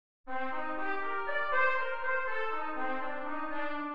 Min7 en C

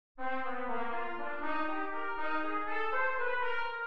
Min7 en C

